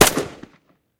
metkij kontrolnij vistrel

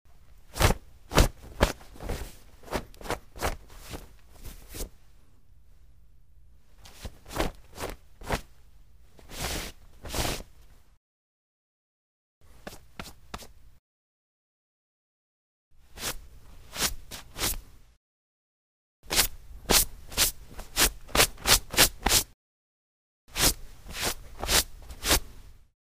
chistyat odezhdu shetkoj

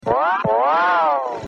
zvuk visokogo prizhka v multike

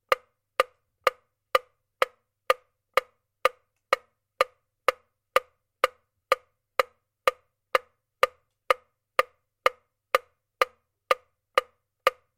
mehanicheskij metronom

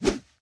korotkij moshnij zamah

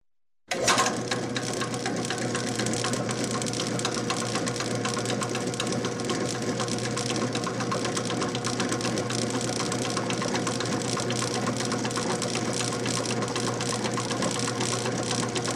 zvuk elektricheskogo nasosa pusk rabota ostanovka

